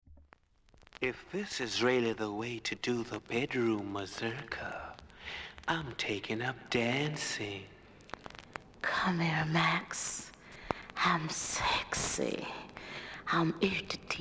MAZURKA

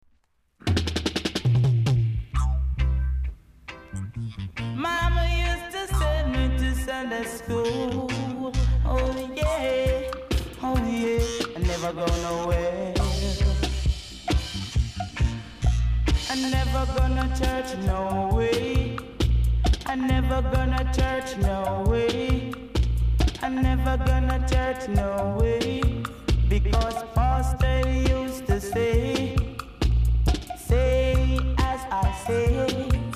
DISCO MUSIC

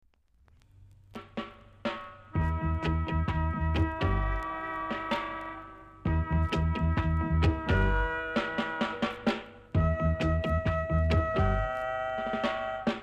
AFRICAN DRUMS BONGO

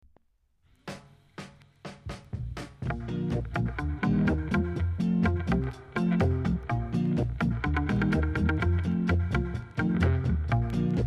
REGGAE; ska 2014

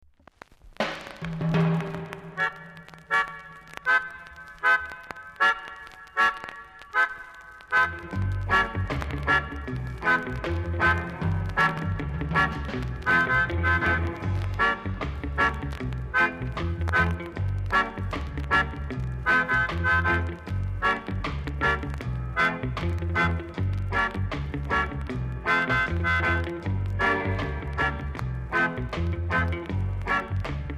MAZURKA

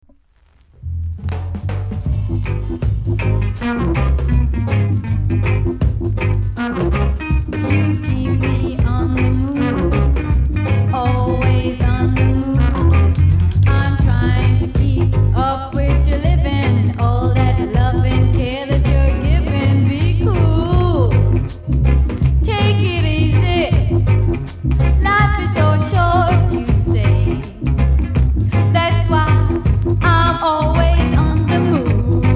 REGGAE; ska 2014

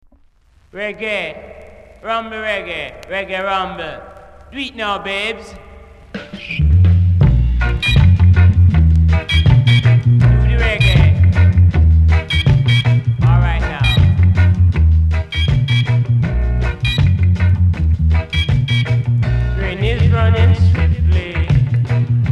REGGAE; ska 2014

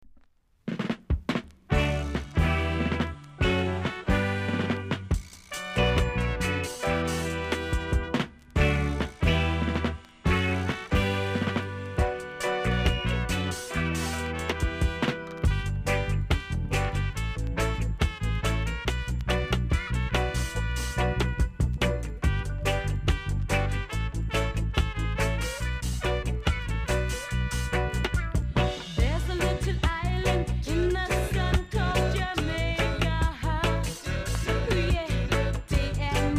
REGGAE POUNDING

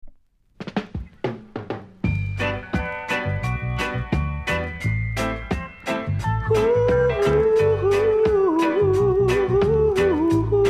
REGGAE DISCO ROCKERS

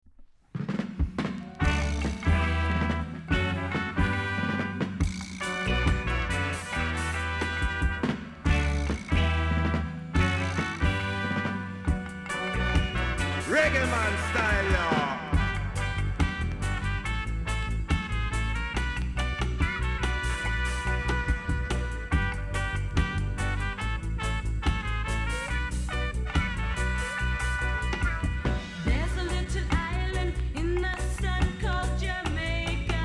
REGGAE POUNDING

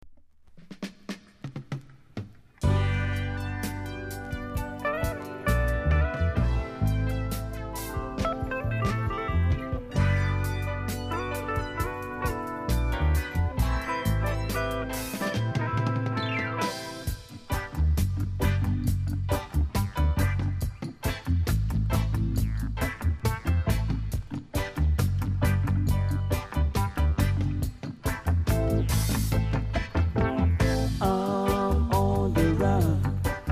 COOL REGGAE MUSIC

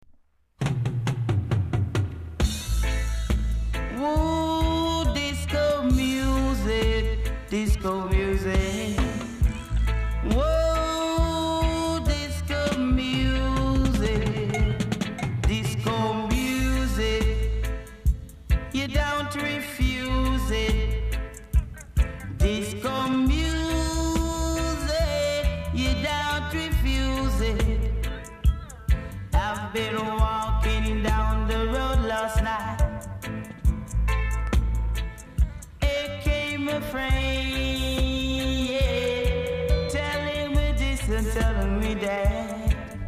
DISCO MUSIC

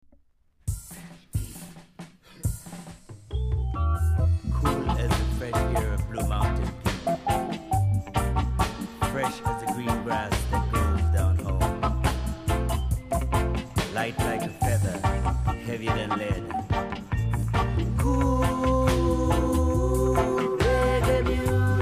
COOL REGGAE MUSIC

